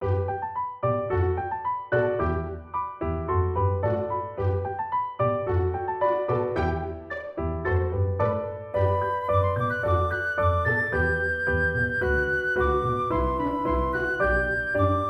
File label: Happy Tune; pygame platform